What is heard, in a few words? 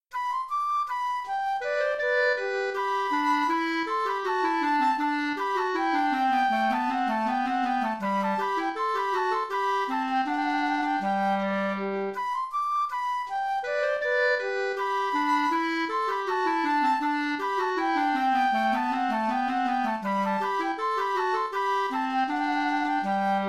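rondeau in g major flute clarinet; romain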